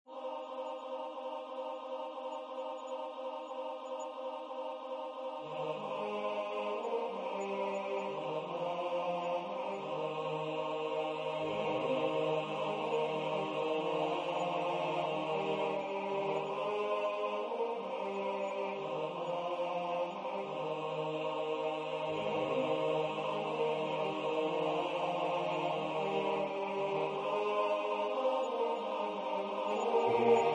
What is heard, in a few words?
SATB